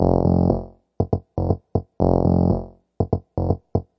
120 phatty bassline clapper, drum loops and breaks